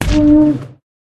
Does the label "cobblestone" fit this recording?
No